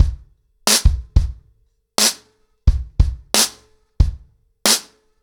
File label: 90BPM, ACOUSTIC DRUMS